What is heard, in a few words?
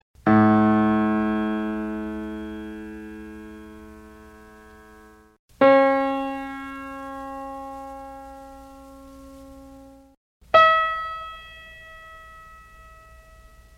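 piano, chamberlin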